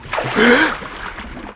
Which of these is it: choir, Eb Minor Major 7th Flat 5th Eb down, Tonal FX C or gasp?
gasp